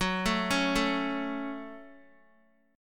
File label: Gb Minor Guitar 4E,4A,5D,5G,5C,6F; arpeggiated; x,9,7,6,9,x